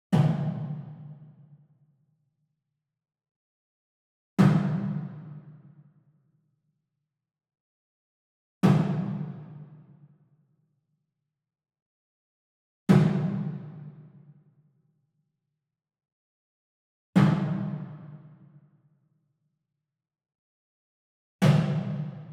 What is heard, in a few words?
Wood Percussion Wood Drum Mounted Hit Slap Far; boom library; Cinematic Strikes Construction Kit